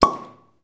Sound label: Wine Bottle Opening; Chateau La Rose Puisseguin Saint Emilion 2005